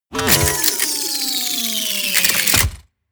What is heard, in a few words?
wind down